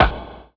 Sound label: bat; BESTSHOT; noisybit